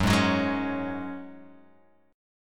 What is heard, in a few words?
F sharp Suspended 2nd, Guitar Open G